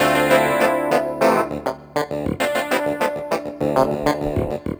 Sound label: phunk keys; Sonic Foundry (Sony Creative Software); Ugly Remnants VOL-2